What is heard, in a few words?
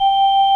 ORG VOX G, Continental; Roland LCDP09 Keys of the 60s and 70s 1